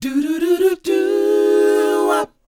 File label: DOWOP A#, Vocal R&B DOO WOP; ILIO; Vocal Planet